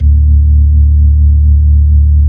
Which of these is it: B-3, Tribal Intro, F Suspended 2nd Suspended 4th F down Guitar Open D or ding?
B-3